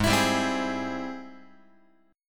Ab Augmented Major 7th, Guitar; strummed; x,4,7,5,4,7; Ab+M7; JGuitar

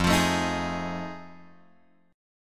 F 7th Sharp 9th; F7#9 Chord {1 X 3 2 4 4} strummed

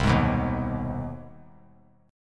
C Minor Major 7th Sharp 5th chord; CmM7#5 strummed; JGuitar

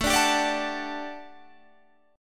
A 13th Suspended 4th A, guitar chord

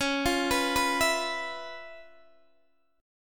Db 7th Sharp 9th, Guitar, DADGAD; arpeggiated; JGuitar

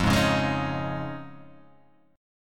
F Minor 7th Sharp 5th, Guitar, Half Step Down

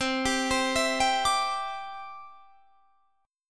C Major Add 9th, Guitar, Standard; arpeggiated; JGuitar

